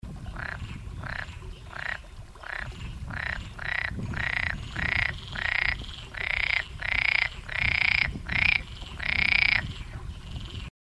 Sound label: Pelophylax saharicus